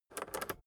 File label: rotary control full turn fast